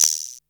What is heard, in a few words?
DR-660 Perc, Drums, Boss DR-660